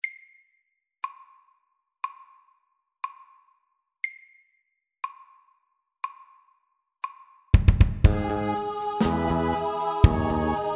Octave rap SAKTE

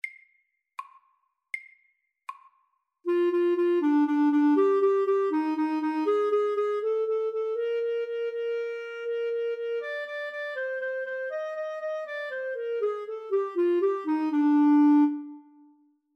Etyde MIDDELS

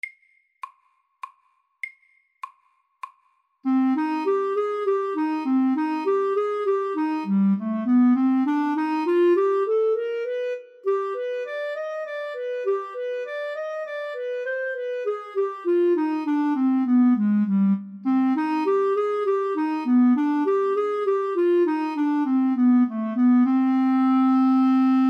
D moll etyde MIDDELS

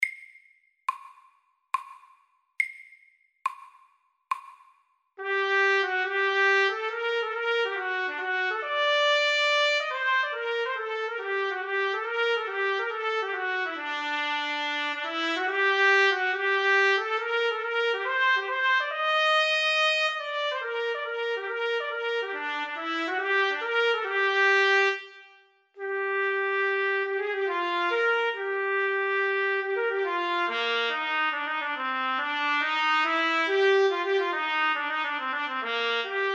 Etyde i A moll MIDDELS

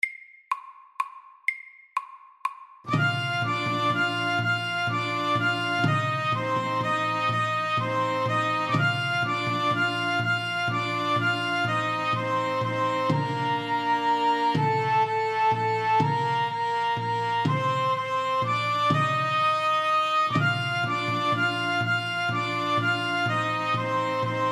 Irsk melodi MIDDELS

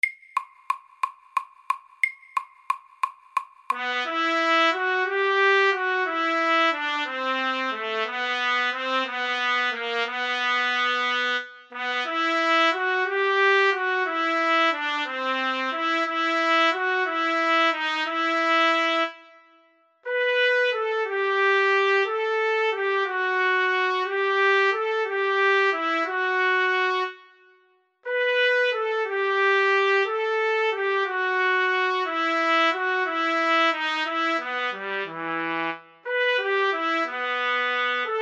Etyde i Fiss moll og Fiss dur FORT

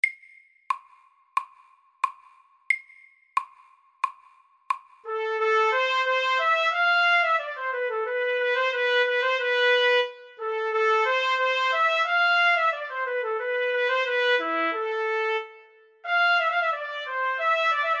Etyde i H moll og H dur FORT

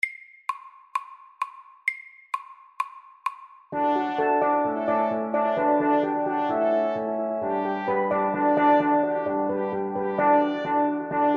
Steel drum band FORT

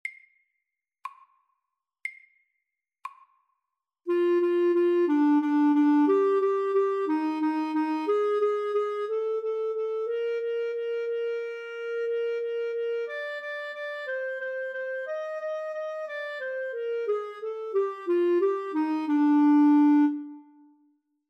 Etyde SAKTE

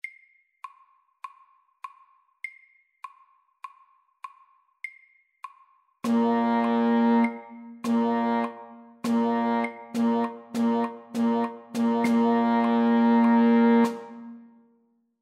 Rytme 4 slag i takten Etterslag MIDDELS

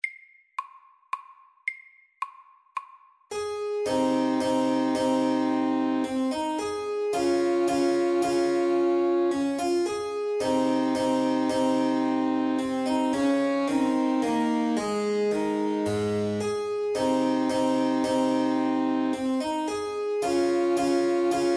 Menuett MEDIUM 110

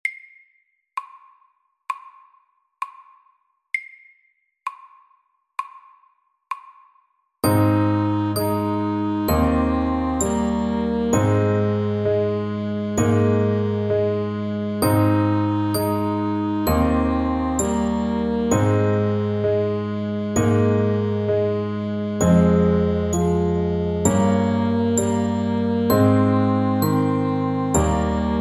Vuggesang SLOW 65

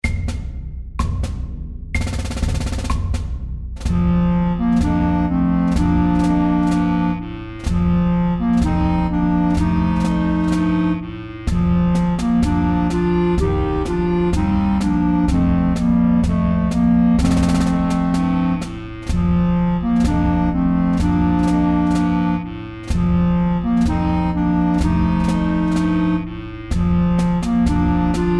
Juniormarsj FAST 126

